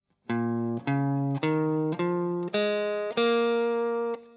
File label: Bb Minor Pent New Shape, guitar